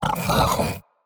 Attack Vo; portal quest; android; Shadow Minion1

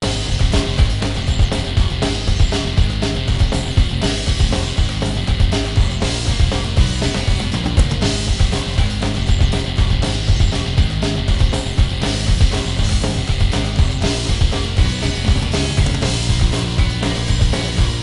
Sting Rage Mode; X-Men Origins Wolverine; NDS

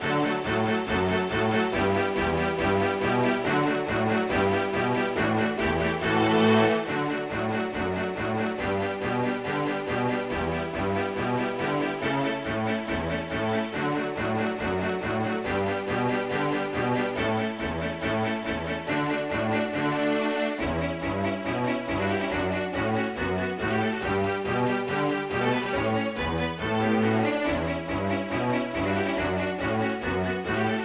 Classical Stage Karaoke; Pinky Street Kira Kira Music Hour Pop Princess; Classical Stage 3

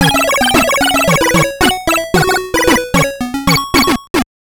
Jingle; Micro Machines Game Gear

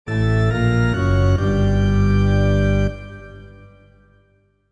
Germany Music Short; Civilization Revolution

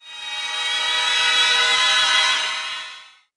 CS STRINGS; CSI: Crime Scene Investigation Deadly Intent The Hidden Cases; NDS gamerip

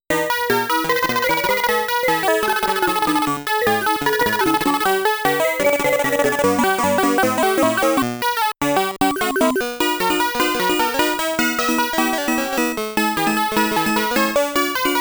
Hurry-up Music (Again); mappy

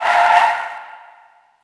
Skids Short

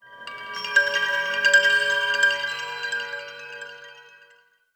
Mystic Eerie

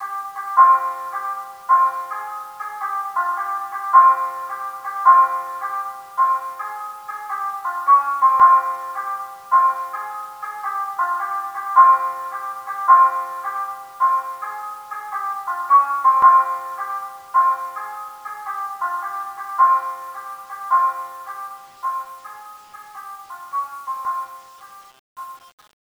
bgm shamisen; yakuza ryu ga gotoku kiwami 2 pc gamerip

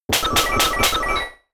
Slap; Wake Up Slap

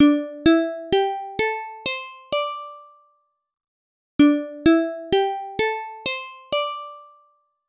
Speaker Test; Pistol Daimyo no Bouken; Namco System 1; arcade

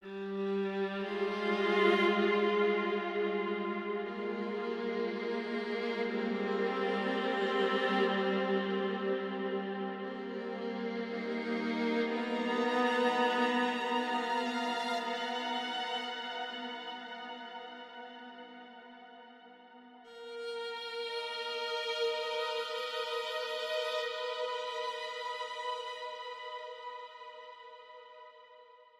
Violin Mini Session; Town of Light

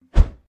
Basketball Shoot; doodle jump 2; android

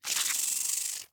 Spider Attack; The Inn

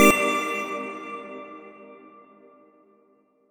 Retry Jingle; Friday Night Funkin Headless Red ost mod windows gamerip 2022

